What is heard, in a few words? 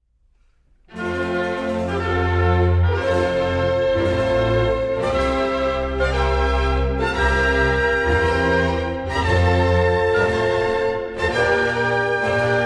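Concerto a due chori in F major, Ouverture; HWV 334; Handel Masterworks; Klassika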